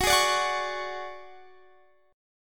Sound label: D Major 7th Flat 5th, chord